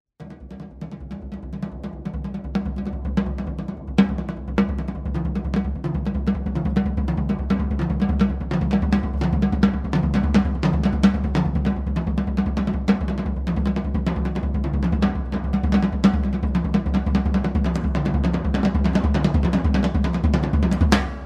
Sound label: sd drum interlude